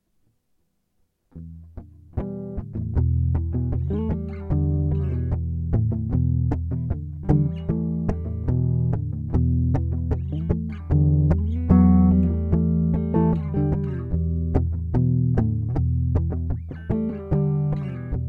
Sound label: Em150 GTR motif